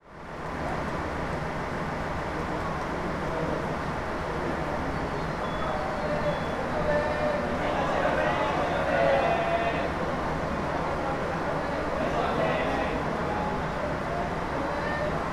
fukushima station

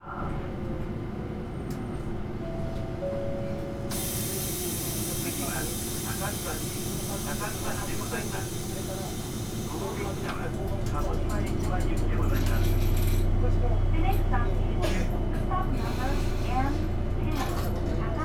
kagoshima city tram

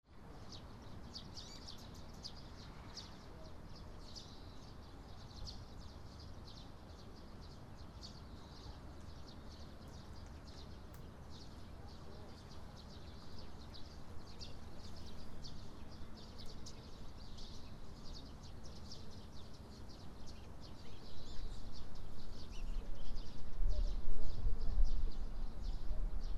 watari jr high

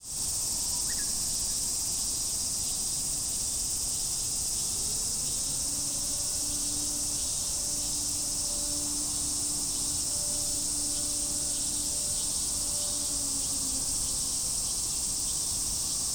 back mountain, Fukushima University; 福島大学経済学部棟側の裏山; 学内から出た放射性汚染物質を一時保管していた裏山は， / 現在入口が閉鎖されており / ，草が生い茂る中で，蝉が鳴いていた．; 録音中，11:30を知らせるサイレンが聞こえてきた．; Koji Nagahata

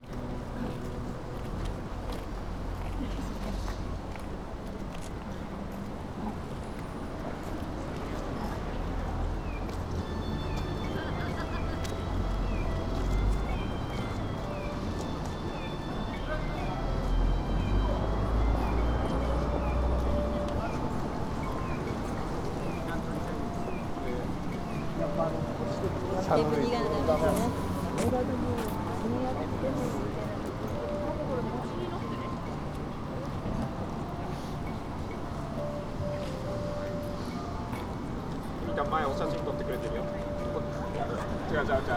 candlenight

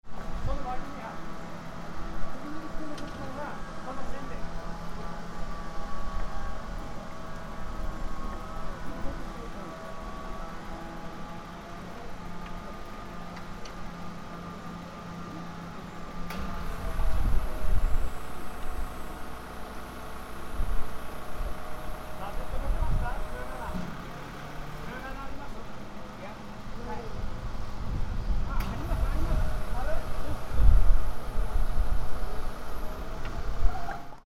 loudspeaker setting

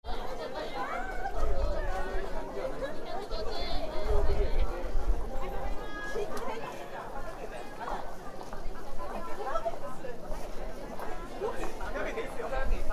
flea market; フリーマーケット; 福島大学避難所 / に届いた支援物資で少量残ったものを換金して義援金に回すための / フリーマーケット / にて．人の声があたたかい．

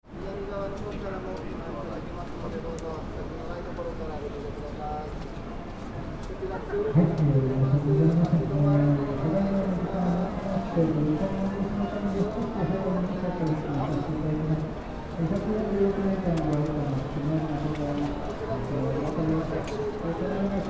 nakago closure, Fukushima

